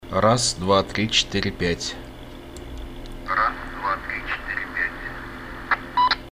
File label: echo rep